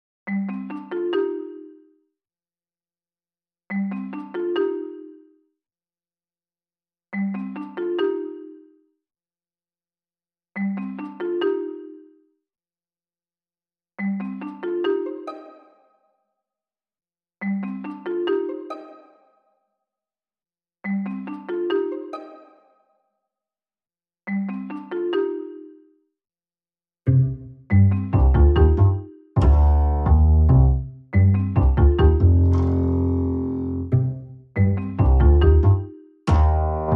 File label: No Melody No Percussion Reduced Arrangement